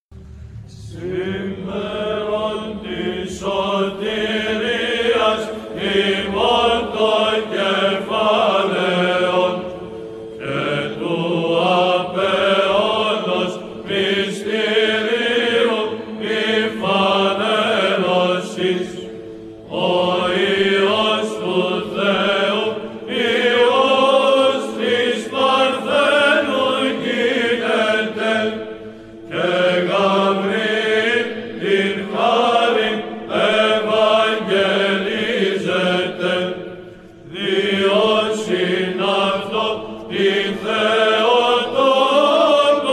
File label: Simeron Tis Sotirias, Choir; ChoirVSfak